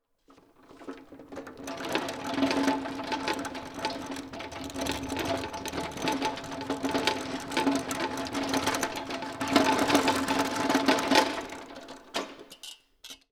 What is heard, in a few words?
Metal Trolly; 99Sounds; The Warehouse